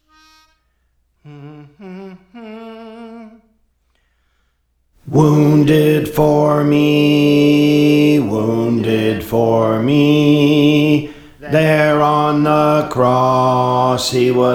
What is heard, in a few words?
Bass; Praise For The Lord Training